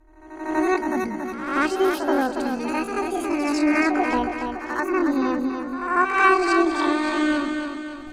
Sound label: Sound Alien